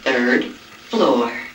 floor

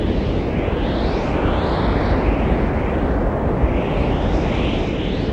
pelican hover

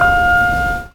elevator up

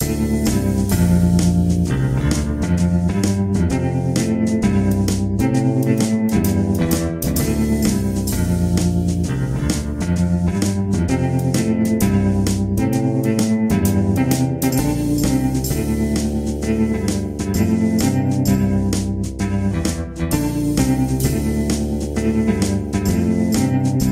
music; blizzard

